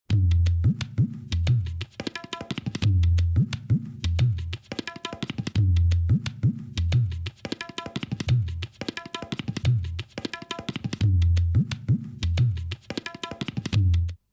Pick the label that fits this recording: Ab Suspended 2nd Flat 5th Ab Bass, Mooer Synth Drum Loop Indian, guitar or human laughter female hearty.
Mooer Synth Drum Loop Indian